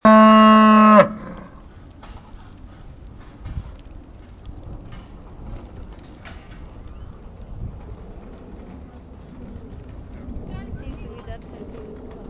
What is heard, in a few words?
moo